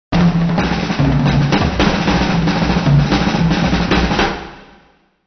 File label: fills jazz